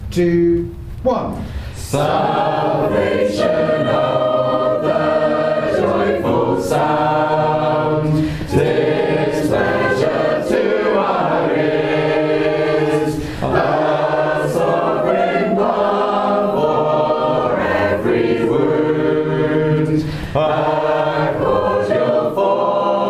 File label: Shape Note Singing; Primrose